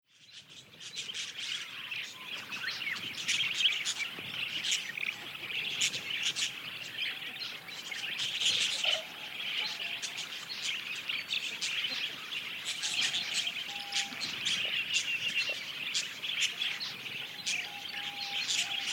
kryakane zvuk privlekayushij vnimanie

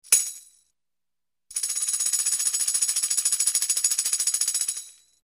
tamburin

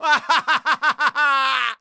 isterichnij sumasshedshij muzhskoj smeh

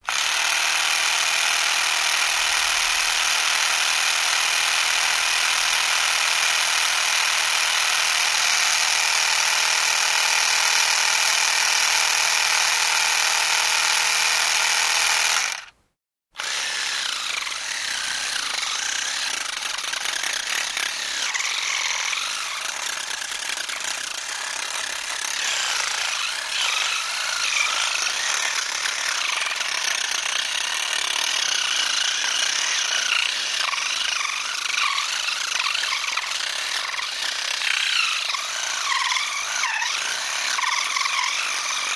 elektricheskaya zubnaya shetka

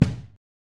moshnij udarnij baraban

